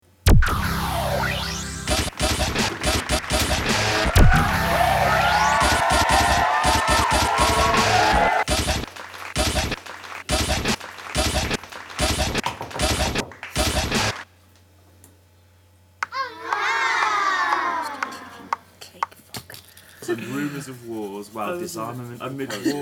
Backing Track

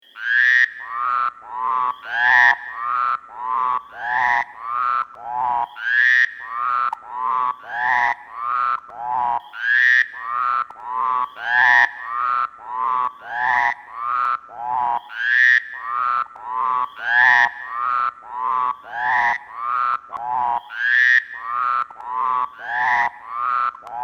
Frogs; Kearns

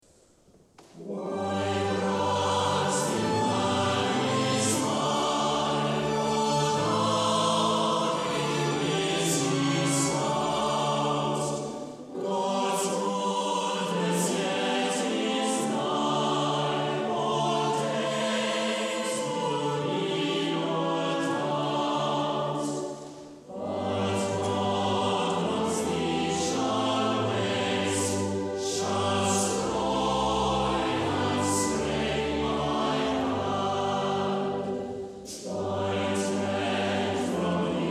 Tune

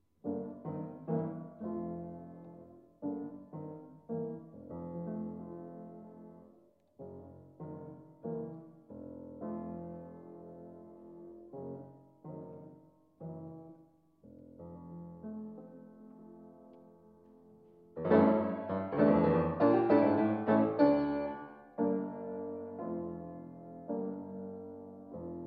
dialogue; Odins Meeresritt; bb. 29-36

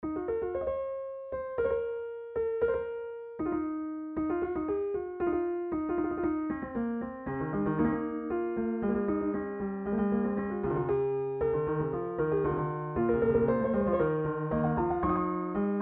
Fugue in B flat subminor; Bates, Joe

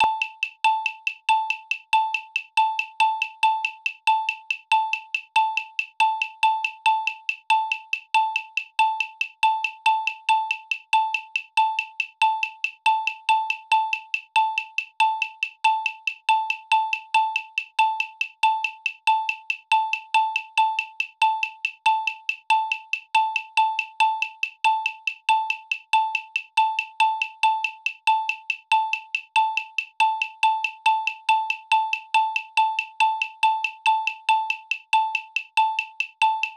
Click Track; Vlachos, Konstantinos

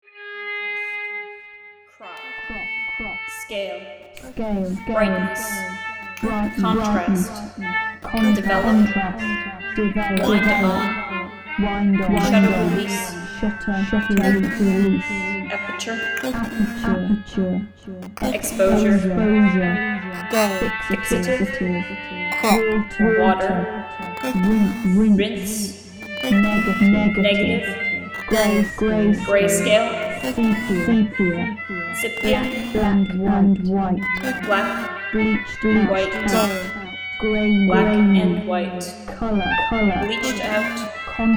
Backing; Jell-O Theatre

